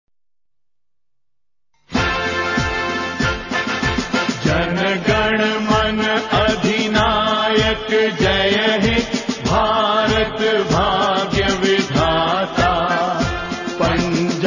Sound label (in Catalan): himne India curt